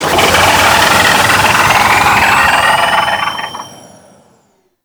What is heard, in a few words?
Reverse, Vehicles; Warframe; Archwing Demolition